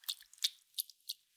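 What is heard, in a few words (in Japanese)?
くちゅ 長, 水音効果音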